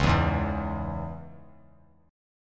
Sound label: C sharp Minor, chord; C#m; strummed; JGuitar